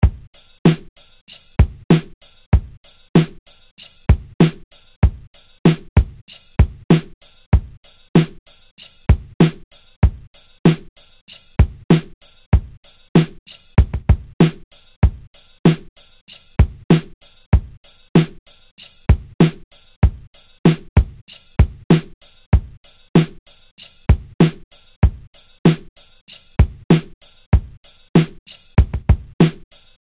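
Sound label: orchestral beat 96bpm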